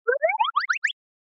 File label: Motion Slide Slow